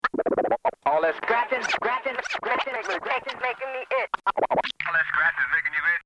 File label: scratchin